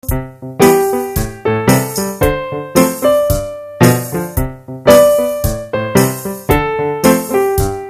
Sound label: piano